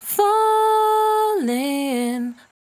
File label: 68bpm D, Velvet Vocal; Zero-G